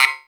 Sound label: PERC 160 BPM; Ueberschall; Drum & Bass Flash Trackz